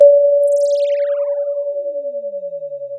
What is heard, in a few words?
pop, Sound of a phone ringing